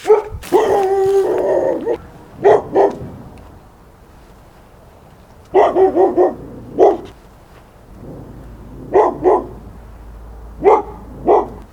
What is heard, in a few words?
lab in ally, barking dog